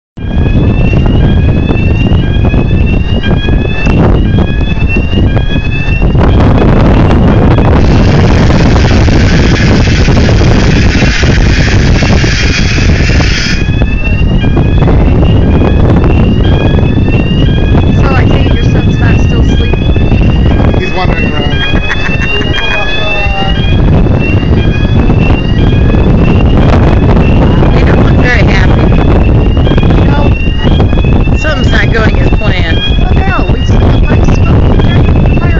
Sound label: carnival triumph engine fire